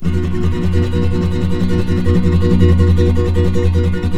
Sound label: C MJ, Guitars; Spectrasonics; Hans Zimmer Guitars VOL-2; FLAM TREM CH